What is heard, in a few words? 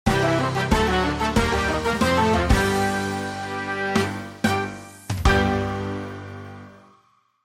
Victory! (Cutscene Music); Doodle Champion Island Games